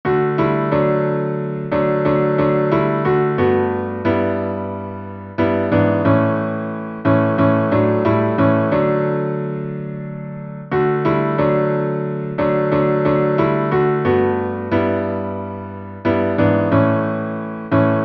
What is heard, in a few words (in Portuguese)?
salmo instrumental